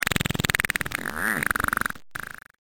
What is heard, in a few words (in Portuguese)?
PEIDO